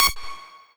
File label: lead